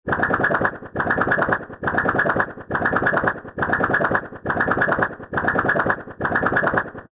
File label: odd whooping or squelching comedic sound effect